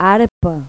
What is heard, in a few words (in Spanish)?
ARPA; Pipo-Aprende musica con Pipo